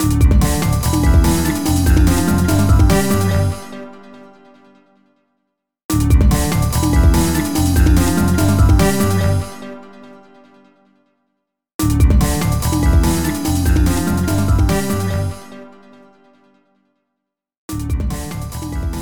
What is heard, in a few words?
Minigame Success; Gremlins Gizmo; wii